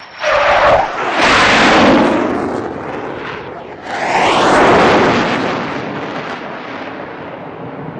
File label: F104 Awy, f-104 starfighter